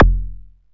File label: BOOM, BASSDRUM, Techno 138 BPM; Best Service ProSamples vol.54; AKAI